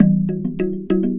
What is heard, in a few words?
Box Drum; Worldly Rhythms; Sing; Sonic Foundry (Sony Creative Software); Ma Ja Le Ethereal Textures